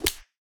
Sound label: mob spanking hard